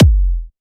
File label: reverb kick drum sound F sharp key; kick drum samples